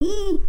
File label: Edge Backwards; foley